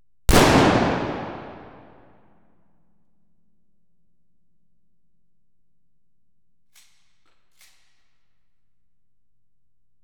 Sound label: Explosions, Gunfire & Cannons, Pistols & Rifles; Telarc Sampler